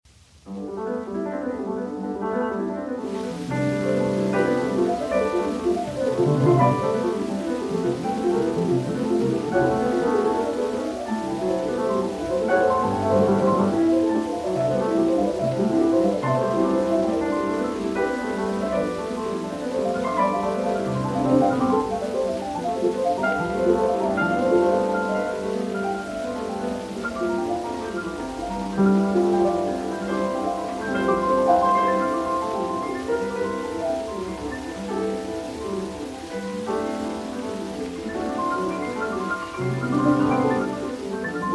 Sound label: chopin prelude op.28 no.23 F + prelude op.28 no.3 G; rosenthal